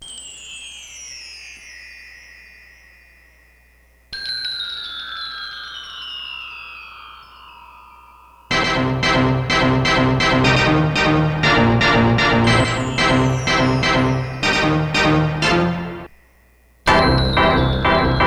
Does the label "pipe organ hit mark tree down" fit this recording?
Yes